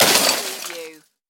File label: Crash Plastic Glass